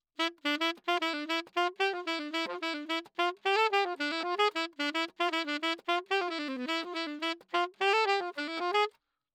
Sax

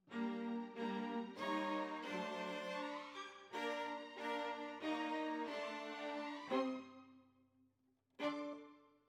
Violas, jazz for piano

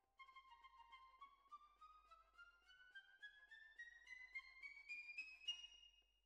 Bassoon, Jazz for piano

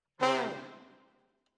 Trombones, jazz for piano

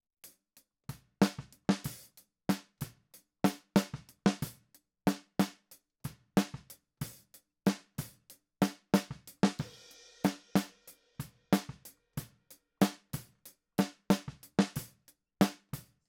Snare

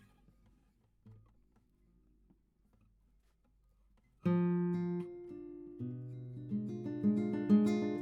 GTR

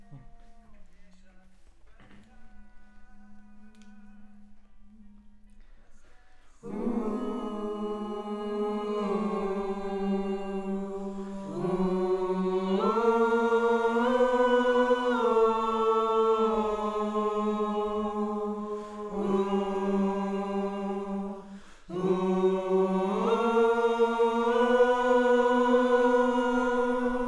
Choir

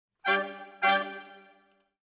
Brass, Jazz for piano

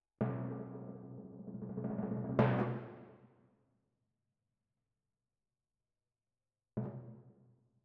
Twin F Timp

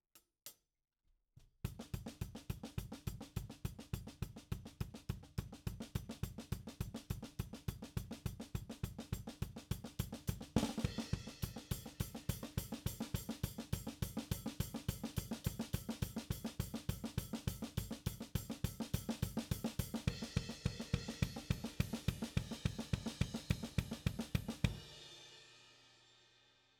SNR top

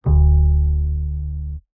Bass, Jazz for piano

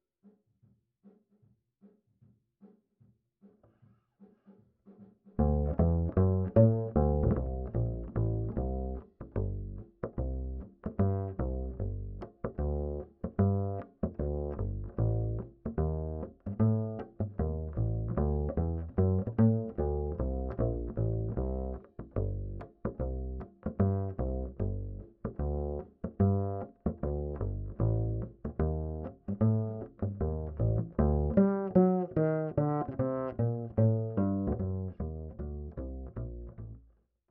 Bass Line, Ragtime

